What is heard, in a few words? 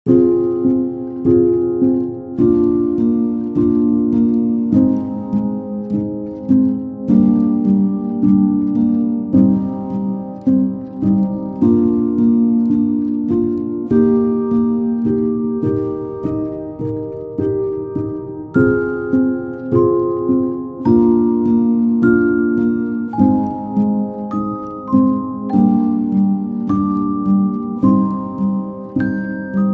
Camas (Instrumental)